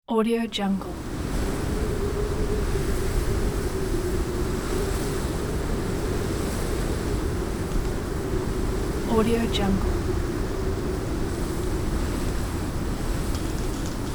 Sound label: wind storm